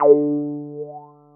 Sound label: GUI MULT; EastWest; Dance Mega Hip Hop Electro